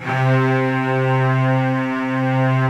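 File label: C3, major minor violin trill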